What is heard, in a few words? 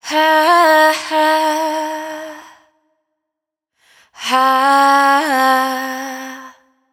riff Breathy Hah 115 Fm wet, vocal; Slate Digital Omnivox Vocal Sample Pack